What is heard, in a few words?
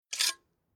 Weapon Sword Old Bayonet Drawn From Sheath Metal Scrap Late 19c; Sword Sound Effects